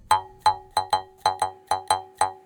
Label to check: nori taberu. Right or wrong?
Wrong